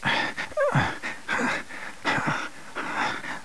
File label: breathe